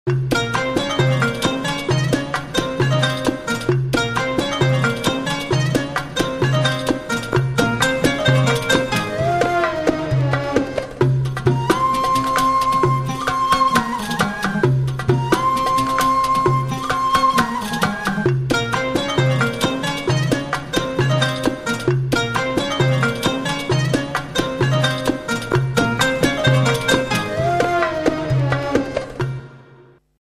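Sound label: Arabian Orchestra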